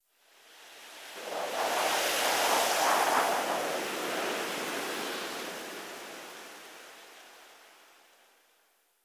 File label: wind leafy whistle; modern warfare 2; amb elements